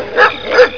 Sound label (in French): aboie, chiens